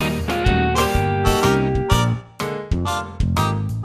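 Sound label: instructional music; Sesame Street Ready, Set, Grover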